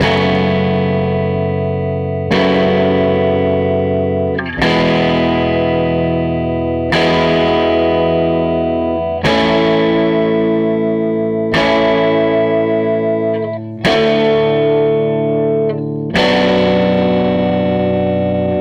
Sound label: SG Guitar Loop